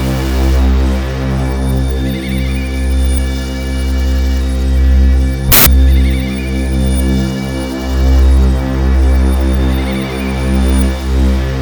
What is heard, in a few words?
CYBERDRONE; Spectrasonic Distorted Reality 1